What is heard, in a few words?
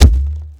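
saran stretch, percussive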